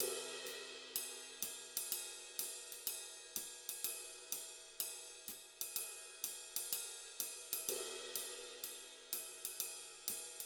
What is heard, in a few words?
Intro music Layer 125bpm; Dude Stop